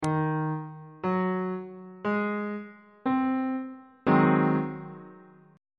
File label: g sharp dominant 7th 2nd inversion